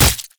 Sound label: basic attacks; Zyra